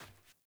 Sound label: Sandals Run Dirt, Footsteps